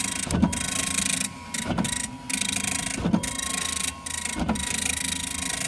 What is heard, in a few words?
PRINTER; 1000fx